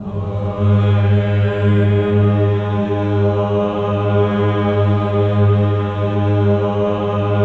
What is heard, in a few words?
VOWEL; Spectrasonics; Symphony of Voices; OCT VOWEL